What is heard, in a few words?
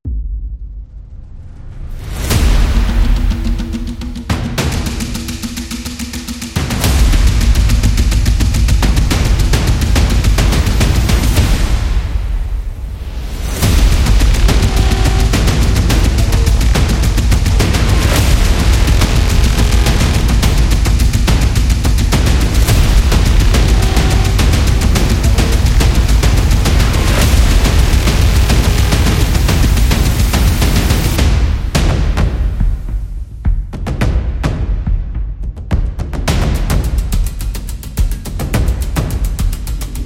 Epic War Drums